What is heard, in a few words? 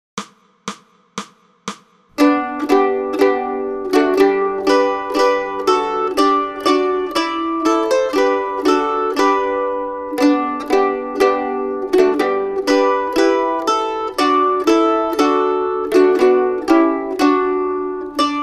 Hark the Herald Angels Sing Mandolin 1 2 3; christmas